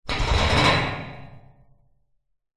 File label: metal bars object run across rattle clunk